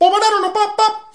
bebop lick, MALE SINGING

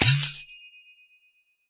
Processed Ethn Perc, ETHNIC and WORLD PERCUSSION; Doru Malaia Percussion

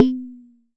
Jomox MBrane, drum machines; Jomox MBrane 11

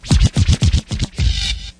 scratch into break; Scratching and Turntablism; DRUM LOOPS and BREAKS

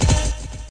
stereo punch; Punches Hits Discoblasts; single hits

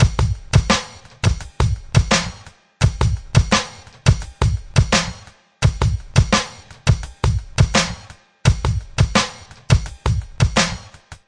085 bpm hammer crunch drummer

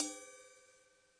crash bell soft low

